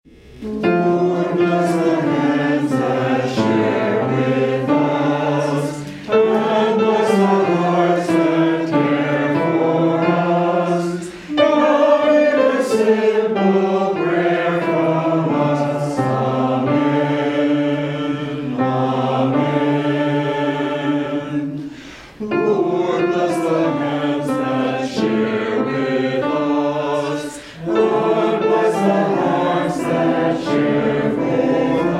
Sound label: Hymn; Lord, Bless the Hands